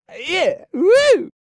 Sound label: Man Dance Happy; Zomboid; Lifestyle